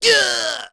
Vox Damage; kingsraid; en

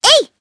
Attack, jp; Hanus; kingsraid

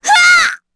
Vox Damage, kr; Shamilla; kingsraid

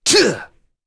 Vox Attack; Baudouin; kingsraid; voices heroes

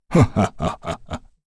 Vox Laugh; kingsraid; voices heroes; en

